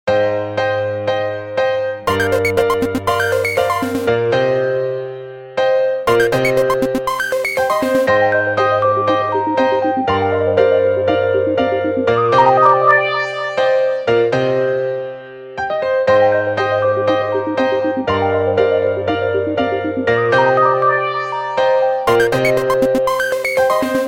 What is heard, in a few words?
Electric Grand Piano